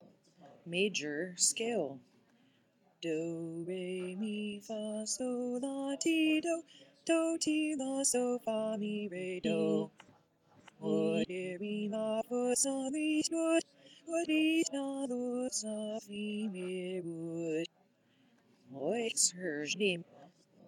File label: major scale recording